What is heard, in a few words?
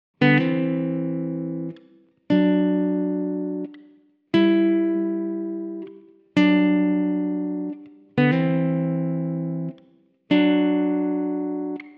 Blues Jazz A minor 120BPM